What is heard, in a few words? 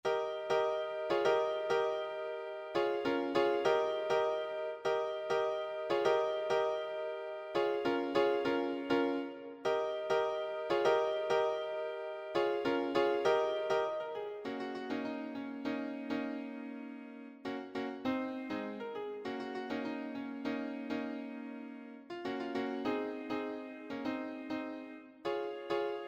audio sample piano